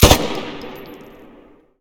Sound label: shoot; Tacz1.1.5+; RSWS 1903